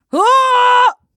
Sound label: Roar; God Tamer